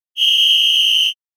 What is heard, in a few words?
whistletone